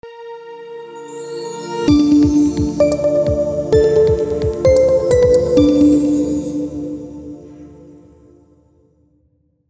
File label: Inspiring Logo